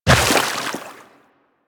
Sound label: Run Water, Footsteps; DE; Warframe; Tenno